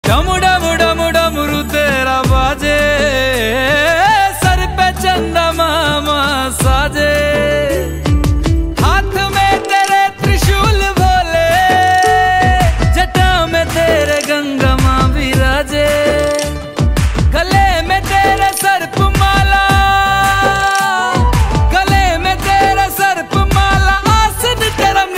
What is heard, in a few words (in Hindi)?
Bhakti Song